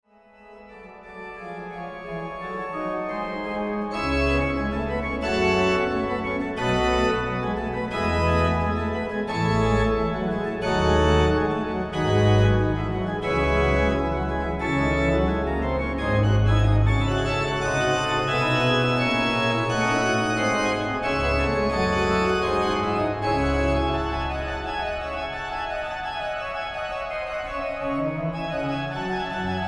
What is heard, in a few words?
concerto d-moll bwv 596 allegro